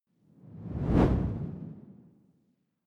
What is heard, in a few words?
whoosh simple hard low